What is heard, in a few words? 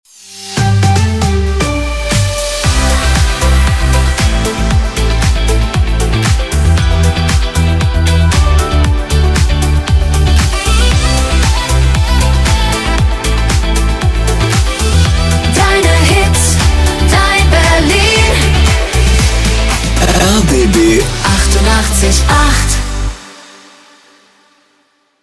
Jingle Long Vox